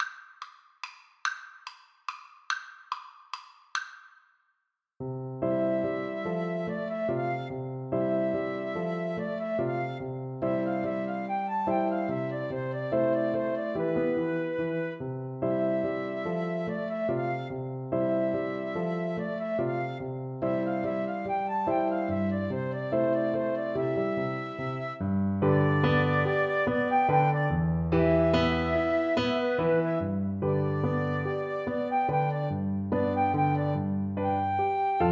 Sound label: Flute duet